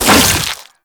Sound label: Fast Whoosh, Weapons; Infested; Coda Pathocyst